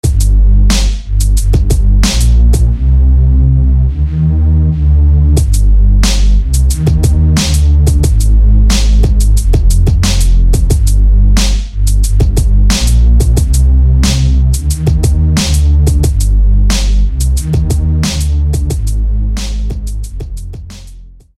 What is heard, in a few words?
Bass; Seismik; SFB FX